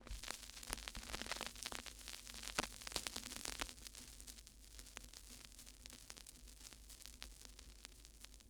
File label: Vinyl Crackles